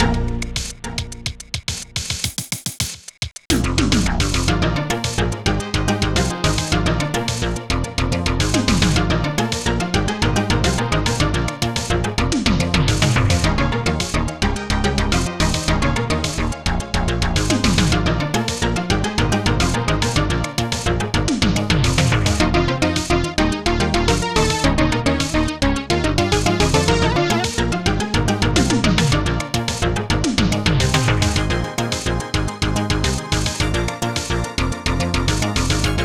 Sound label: amiga funky